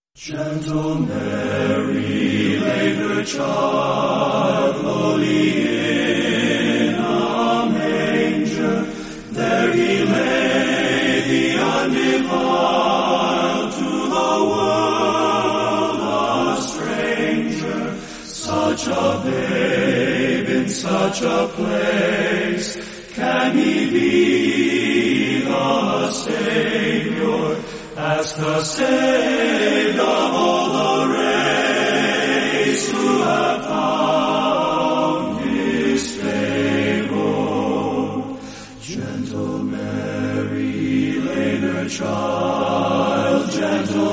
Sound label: men's chorus